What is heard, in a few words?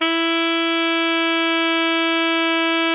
alto FF E 3, saxophones; Greytsounds; Philip Wolfe David Crozier's Saxophones